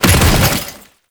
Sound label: Body Fall Default, Foley; Enemies; Warframe; Tau Dax